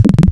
Kicking, Serum Wavetables; Synthetic's Serum Collection; Cymatics - Nuclear for Xfer Serum Wavetables

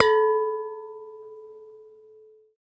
dissonant bell; Synthetic's Serum Collection; virtual riot

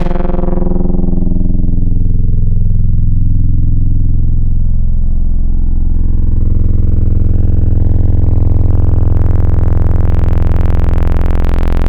simple morph; Synthetic's Serum Collection; Echo Sound Works - Modular

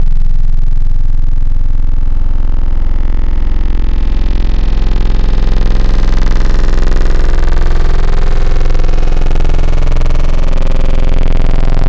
Growl Sputter; Wavetables; Synthetic's Serum Collection; ESW Core Wavetables; Echo Sound Works Core Tables

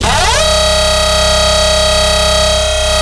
Banshee, Noise; Cymatics; Shapeshift

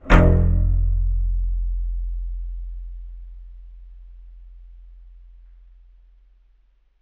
Acoustic Low Mute; Synthetic's Serum Collection; Surge Sounds - ASTRO - Serum Wavetables

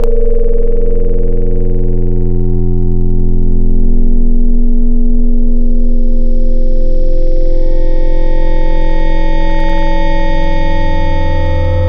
Metallic Ring Tone; Wavetables; Synthetic's Serum Collection; ESW Core Wavetables; Echo Sound Works Core Tables

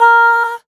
Noises Vocal Shot

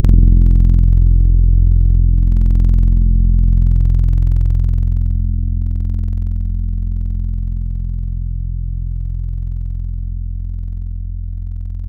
Smooth Piano; Synthetic's Serum Collection; Surge Sounds - The Sky - Serum Wavetables

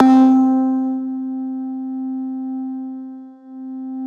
Broken Harp, Noise; Cymatics; Shapeshift

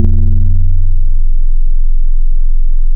Real Glass Marimba; Keys; Synthetic's Serum Collection; ESW X Keys

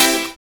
piano; 'Go Fuck Yourself' samples; lolicore

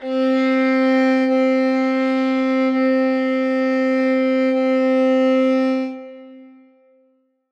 violin sustain C4; Aubit

